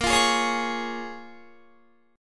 Bb Minor 7th, Guitar; Bbm7 strummed

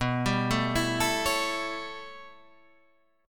A 7th B, chord; A7/B; arpeggiated

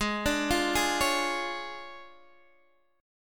D Minor Major 7th Flat 5th, G sharp

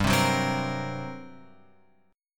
G Minor Major 7th Double Flat 5th G; GmM7bb5; {3 3 4 5 X 6} strummed

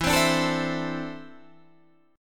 D 7th Suspended 2nd, chord

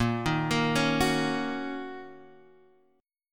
Fsharp 6th, guitar chord

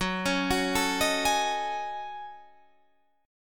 Gb Minor 6th Add 9th Gb down Guitar Standard; arpeggiated; JGuitar

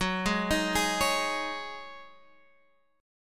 G sharp 7th Suspended 4th F sharp

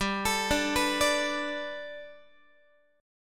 G Major Add 9th Guitar, chord; Gadd9; arpeggiated; x,15,12,12,15,13

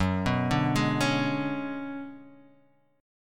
B Minor 6th Add 9th F sharp

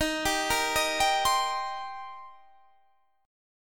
D sharp 6th; D#6; arpeggiated; 23,22,20,20,20,20; chord shape

